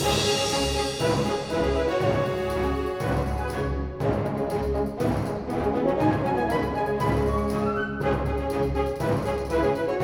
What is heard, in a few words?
Music Fight Theme Easy 120bpm Loop; asterix obelix slap them all 2; Romans